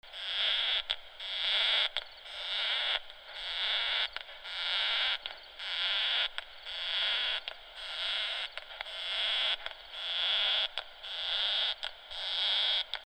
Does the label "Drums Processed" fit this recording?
No